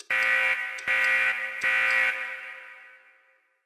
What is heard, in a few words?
Robot factory alarm